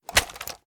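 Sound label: assault rifle reload empty fast end; weapons; gmod; viper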